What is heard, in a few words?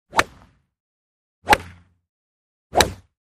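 Golf Swing Driver Wood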